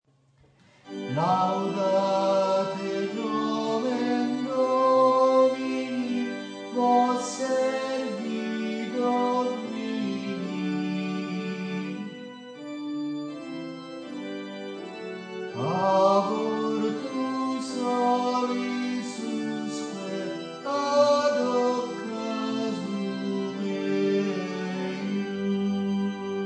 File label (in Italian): soprani